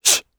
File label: attack voice; UFC Tapout